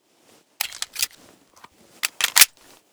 pb reload, weapons

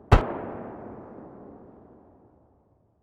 ash12 muzzle mono far, weapons; STALKER; IWP

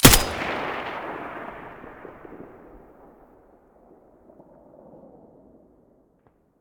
M4A1 muzzle stereo

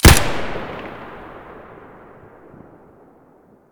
sks muzzle stereo, weapons

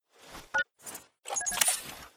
antirad use; exo; STALKER; IWP; item usage

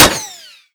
metall, bullet collide; STALKER; IWP

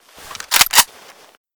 scar misfire, weapons

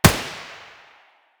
p90 muzzle mono distant, weapons

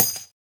Shell heavy dirt; STALKER; IWP

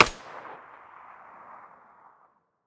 mp5 muzzle mono far, weapons

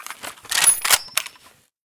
sa58 misfire

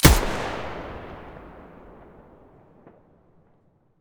mdr muzzle stereo, weapons

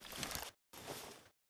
torch use; STALKER; IWP; item usage

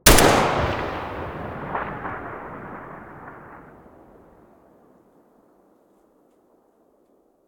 galil muzzle mono

